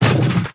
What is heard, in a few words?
cart fall; Cars; XBOX